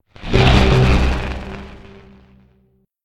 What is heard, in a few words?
projectile; smite; raijin